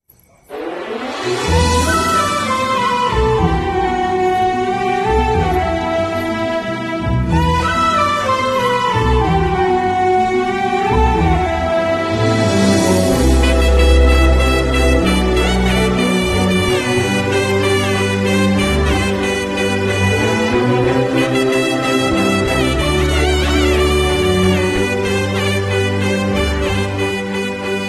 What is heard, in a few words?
love bgm